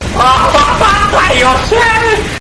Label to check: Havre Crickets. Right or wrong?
Wrong